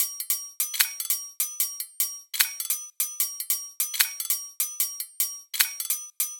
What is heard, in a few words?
Kitchen Foley 150BPM, drums; Antidote Advent; Kitchen Foley Sounds & Loops